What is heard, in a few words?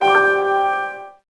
Sign In